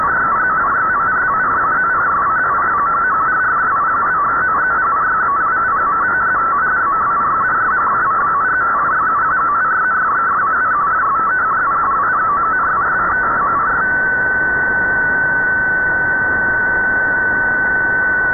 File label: Weird marine signal